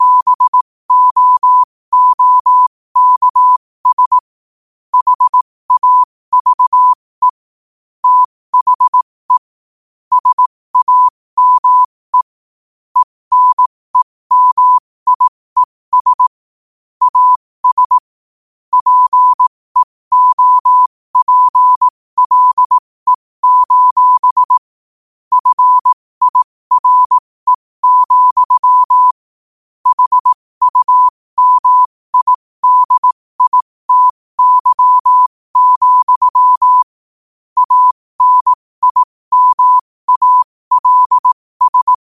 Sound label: 5 wpm, morse